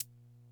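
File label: closed hi hat, univox; classic machines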